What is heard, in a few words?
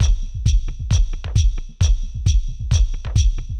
thump 133; Loops